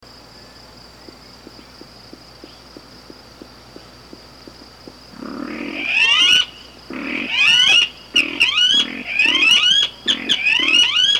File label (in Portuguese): Canto da saracura sana